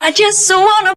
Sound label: i just want to SUNG